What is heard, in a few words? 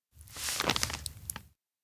map tear drop multi; awayuki; anemoi パッケージ版